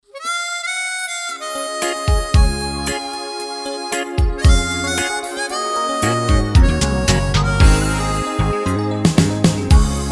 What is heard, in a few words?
Blind Beat, tyros5